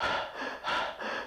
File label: breath